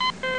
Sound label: Beep